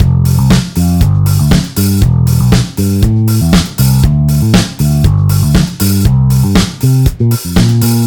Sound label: bass 16 beat